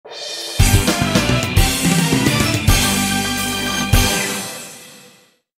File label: Victory; MLB Power Pros